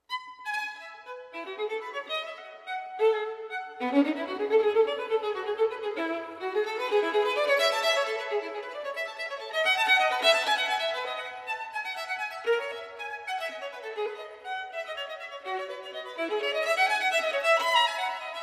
Partita No. 3 in E major - Gigue, Sonatas and Partitas for Violin Solo; Bach